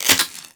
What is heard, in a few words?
Quick Draw Mech; weapons; Warframe; The New War; Omicrus